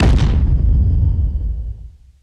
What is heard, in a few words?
stomper stomp, enemies; Factorio